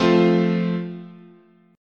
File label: F Major, chord, guitar